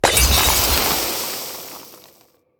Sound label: Sand Art Elemental Death